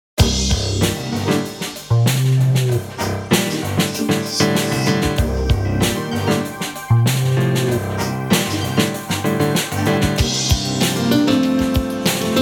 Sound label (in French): Improvisation dans la ville